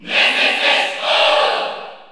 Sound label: Cheer French; Ness; SSBU